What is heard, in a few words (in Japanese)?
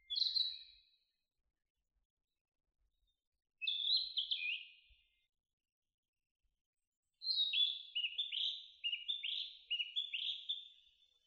kibitaki saezuri